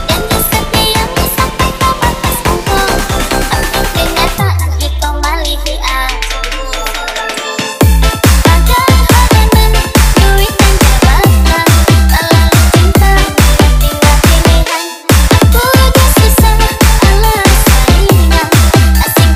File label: Dj Dayak Full Beat